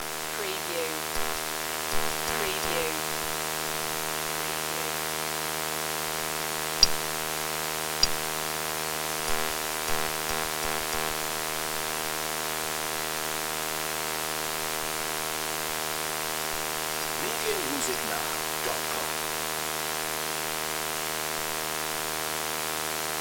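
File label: Handheld CB Static Snow; Cobra Hanheld CB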